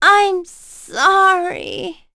Vox Dead; Juno; kings raid; en

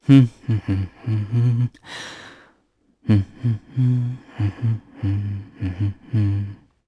Hum, jp; Roi; kingsraid

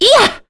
Attack; Priscilla; kings raid; en

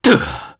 Vox Landing, jp; voices; heroes; Roman; kingsraid

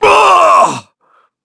Vox Damage; Shakmeh; kingsraid

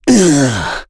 Vox Damage; kingsraid; voices heroes en

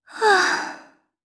Sigh; jp; kingsraid

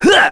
Jump, Vox; en; Roman; kingsraid

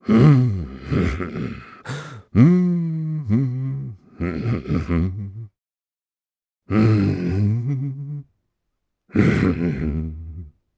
Hum, jp; Kaulah; Kings Raid

